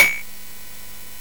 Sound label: CSG8580R5, GAMES; Street Rod